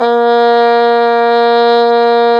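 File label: brass plunger trumpet, plunger mute; Plunger & Wa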